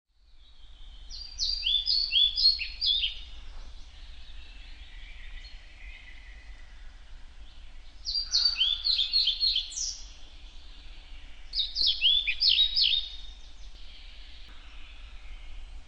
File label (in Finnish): kirjosieppo